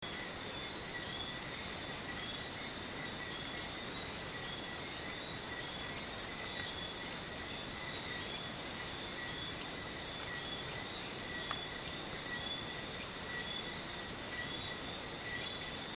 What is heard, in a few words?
tiaris olivacea bryanti; windsor jamaica; West Indian